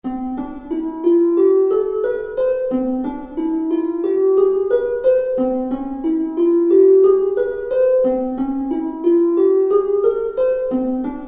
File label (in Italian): scale musicali